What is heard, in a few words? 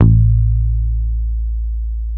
Basses, Roland